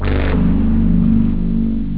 square

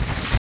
panel out; ENGLISH; Far Gate

